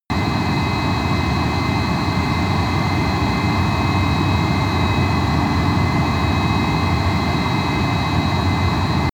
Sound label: military jet bomber turbine steady tonal noise; Airplanes - Jets